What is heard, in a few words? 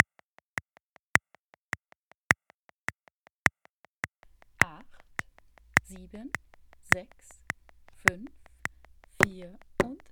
Play-Along Fiddle; Streicher innen; Hundred Pipers